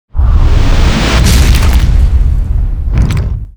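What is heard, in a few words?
arcane golem attack, monster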